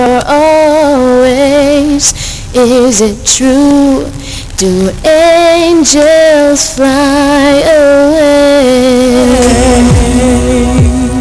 singing

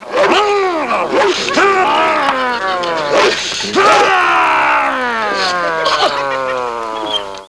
grr

